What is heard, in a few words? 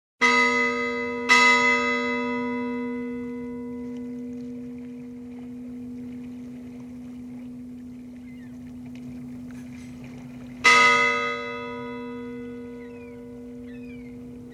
Bell Bouy; SOUND EFFECTS1